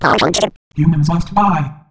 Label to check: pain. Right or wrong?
Right